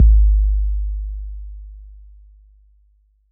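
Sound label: bass drum 808 Decay E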